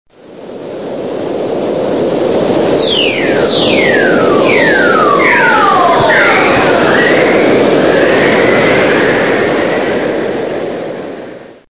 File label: spherics whistler